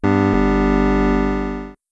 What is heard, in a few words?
Startup; Windows 2.5x Startup; Windows Never Released Soundpack 1